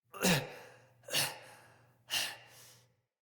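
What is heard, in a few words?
Cough Walk, Barks; Battle; Envoy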